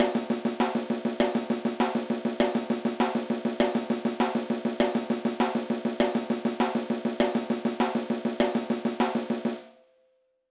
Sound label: Hand Exercise